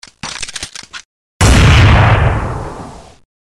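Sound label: sound of reloading and firing from a rifle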